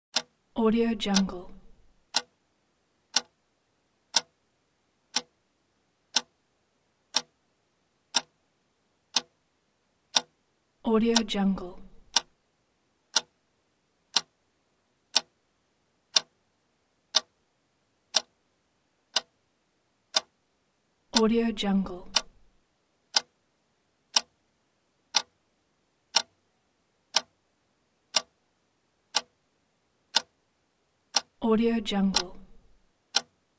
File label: clock ticking